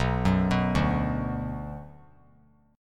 B-Minor; arpeggiated; JGuitar

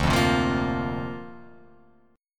D-Minor 7th Sharp 5th D Guitar Open F, chord; Dm7#5; strummed

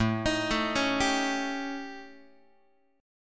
A 7th Flat 5th Guitar; A7b5 arpeggiated; JGuitar

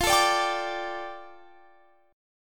F 6th Add 9th F down Guitar Standard, chord; strummed; capo 9

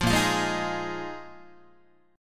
A sharp 7th Suspended 4th, Guitar

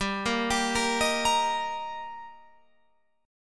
G Minor Sharp 5th G Guitar Open F, chord; arpeggiated; JGuitar

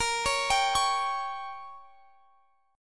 Bb Minor 6th, Guitar, Standard; arpeggiated; JGuitar

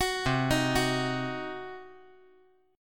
C Diminished C, 5G,4C,5E,5A

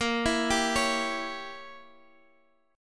A sharp Diminished 7th A sharp, Guitar Open F; arpeggiated

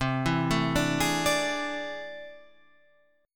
A Minor Major 11th

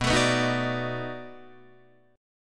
B 7th Sharp 9th, down, Guitar, Open D

